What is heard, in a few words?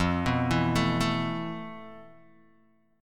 F Major 7th Flat 5th, F, down, Guitar, DADGAD